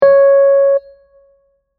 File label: C2 sharp, Keyboard notes